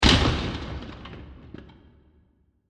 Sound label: Enormous Impact With Bursting Elements